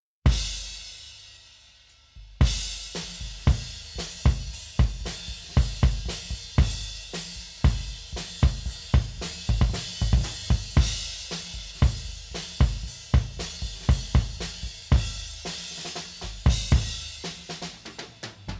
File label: drums